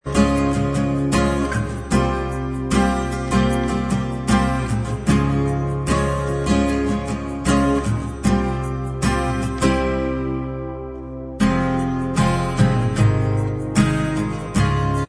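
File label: acoustic version instrumental track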